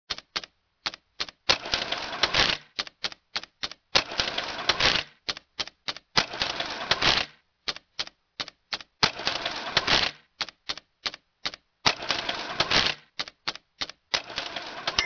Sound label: Ring in sales